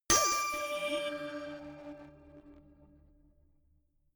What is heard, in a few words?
notification glockenspiel crazy single low; instrumente